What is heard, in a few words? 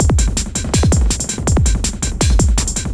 Processed Beat, Drums & Percussion